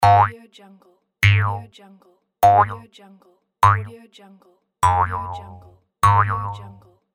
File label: boing